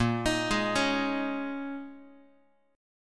Bb Minor 11th, Guitar; x,6,6,6,5,x; arpeggiated; 4A,4E,5A,5D,5G#,6C#; chord shape

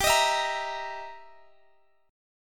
E Minor Major 7th Flat 5th G

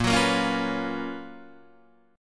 Gb Major 11th

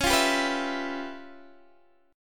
Db 7th Sharp 9th Flat 5th Db down Guitar Open D

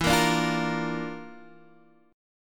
Bb Major E; Bb/E strummed; JGuitar

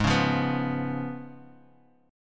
C sharp 7th G sharp

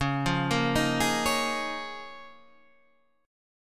Db 6th Add 9th Db down Guitar Half Step Down; Db6add9

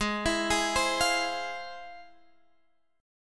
F Minor Major 7th, chord

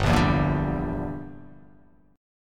G Minor 6th; Gm6 strummed; JGuitar

